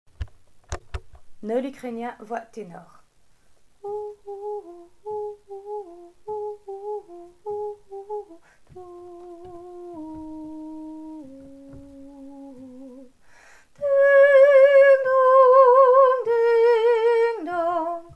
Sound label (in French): tenor; Noël Ukrainien